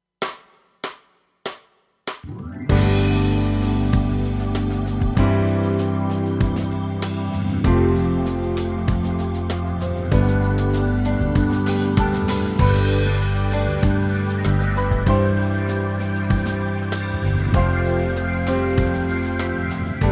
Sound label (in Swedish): Musik